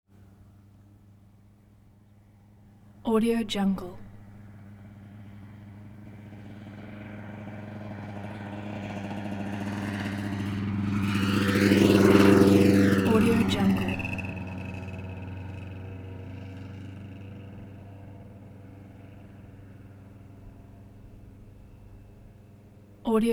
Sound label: 1991 kawasaki 200 motorcycle dirt bike